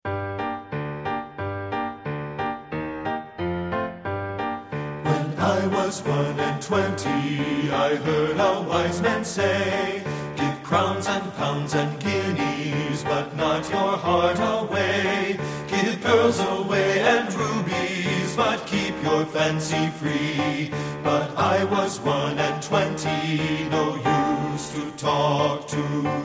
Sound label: When I Was One and Twenty BASS